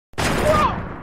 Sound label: Crash Explodes